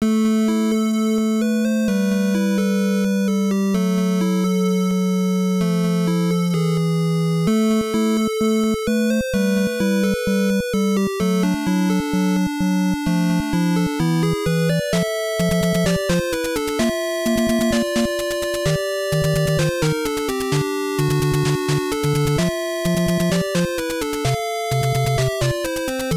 Victory; CastleVania Series; CastleVania 1

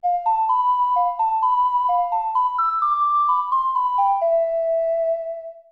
Ocarina; Legend of Zelda, The : Ocarina of Time

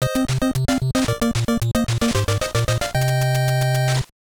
Victory; Castlevania Anthology; Castlevania (Akumajō Dracula) [FDS]

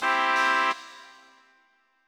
Success!; Banjo-Tooie

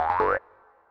Collect Blue Egg; Banjo-Tooie

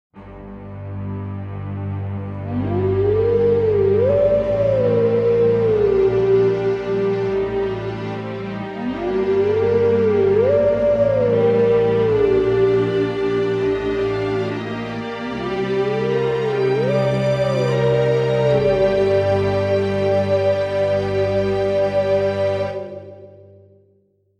Howling Stone - (Duo); Legend of Zelda, The : Twilight Princess

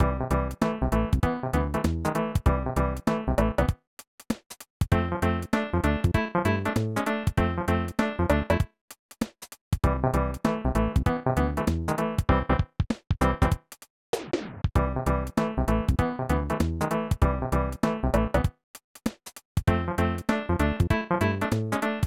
Grass Land; Super Mario All-Stars

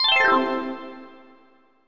Patch Remove; Banjo Kazooie

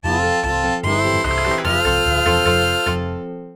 Horse Race Goal; Legend of Zelda, The : Ocarina of Time

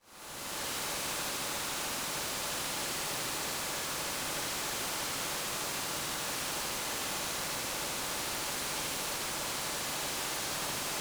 Rain and Flowing Water; Super Nintendo; Chrono Trigger

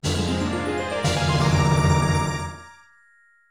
Get Heart Container; Nintendo 64; Legend of Zelda, The - Ocarina of Time

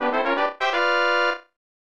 Power Up Fanfare; Super Mario All-Stars

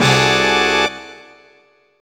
Hit; Banjo Kazooie

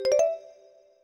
Collect Note; Banjo Tooie

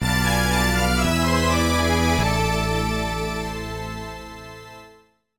Game Over; Castlevania Anthology; Castlevania - Dawn of Sorrow

